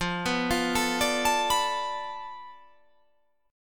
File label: F 6th Flat 5th F down, chord